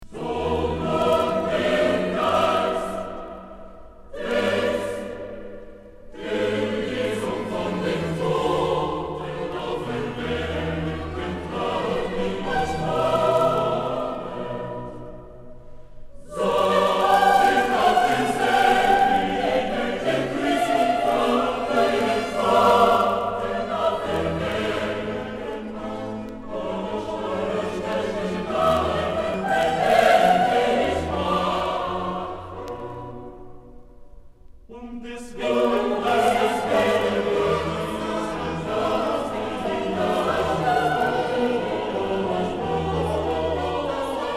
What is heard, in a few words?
Bach Motet, Bach chor; Stockholm; BWV 227 X